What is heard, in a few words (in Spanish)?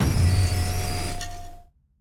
Grito de Pelarena